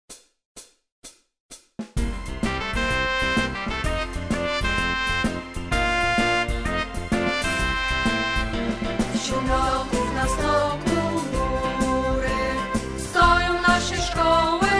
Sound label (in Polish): hymn wersja z wokalem